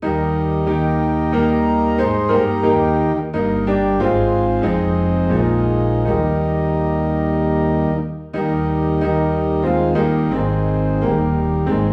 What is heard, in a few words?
Piano and Organ in E Flat; Be Thou My Vision